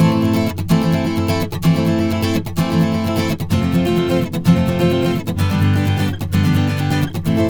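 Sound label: Acoustic Latin Guitar 128BPM A minor; Acoustic Latin Guitars; Vengeance; Essential Clubsounds Vol.4; Guitarloops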